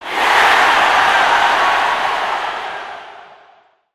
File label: Concert Crowd Oneshot; ambience life